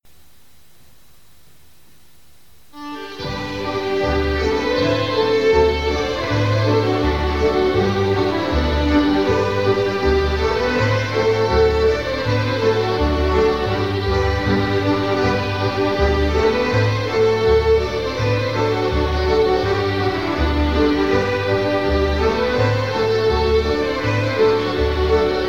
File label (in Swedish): Norsk Shottis